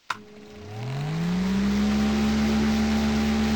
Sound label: fan start; Geschicklichkeit; Hamsterball